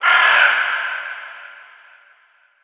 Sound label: environment crow; Aragami